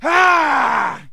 man yell; Mount&Blade Warband

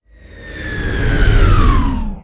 ornithopter motor down; Emperor - Battle for Dune

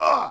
Archer Hit Vox; Sid Meier's Civilization 4 Complete; Warlords; Units

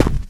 charger run left, footsteps; Left 4 Dead 2

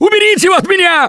scream while pounced; Gambler; russian

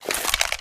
smg silenced deploy; weapons

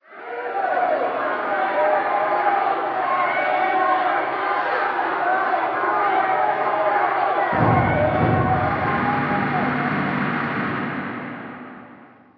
city riot; Garry's Mod; hl2; streetwar

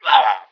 normal dying; Emperor - Battle for Dune

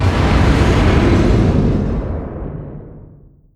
distance ships destroyer attacked; Hearts of Iron IV Death or Dishonor

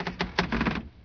wood creak, materials; Garry's Mod; hl2

